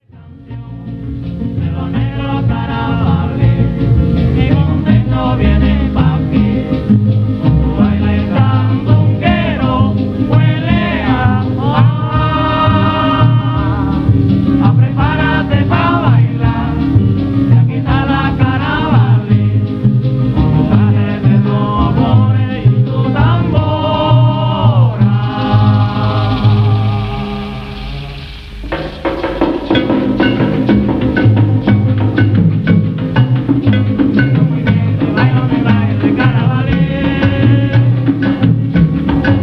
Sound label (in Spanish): Ronda Lirica Oriental. Carabali